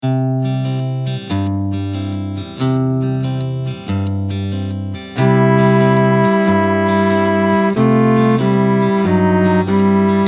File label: KARAOKE